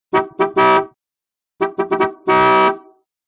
Horn Car Horn Impatie

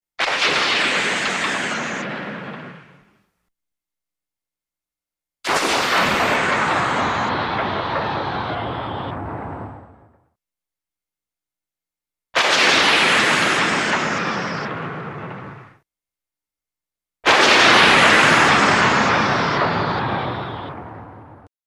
Sharp Electrical Ch

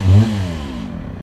Chainsaw Rev Then Tu, chainsaws

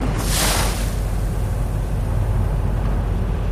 Wave Splash Sml Singl, waves & surf

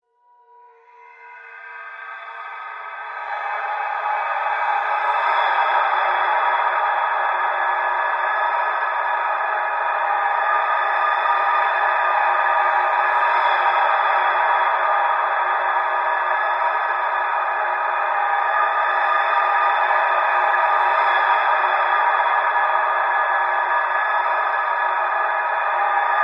Cold Tunnel Amb Tunn; ambience

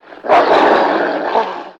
Werewolf Mean Growl

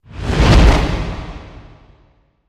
Fire Big Flare By, torches

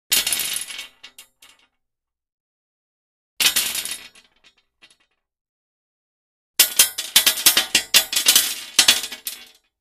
Bullet Drops To Metal, foley gun movement

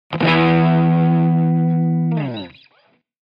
Electric Guitar Soft

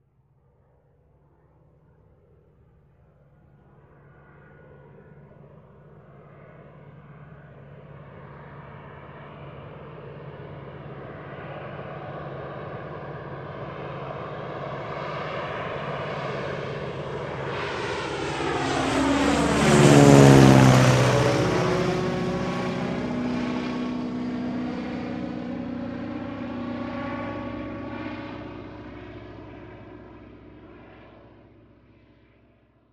Stearman By High, ww2 fighters

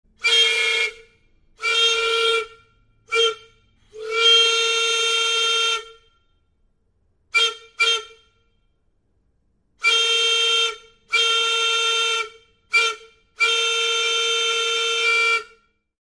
Train Air Whistle; horns

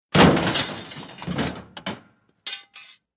Junk Slams Metallic

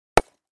Concrete Chunk Hit

